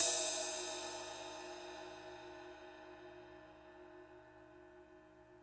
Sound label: CYM RIDE, DRUMS SINGLE HITS